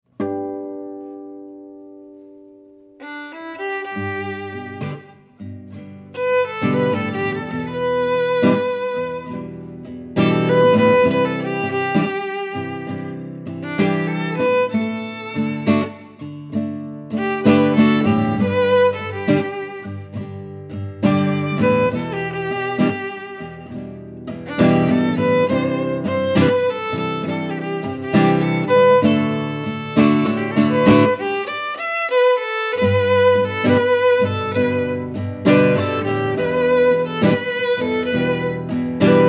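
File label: Violin Guitar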